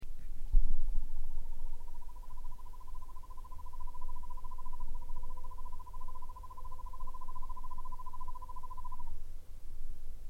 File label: buff-fronted owl